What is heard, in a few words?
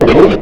attack; slender; steve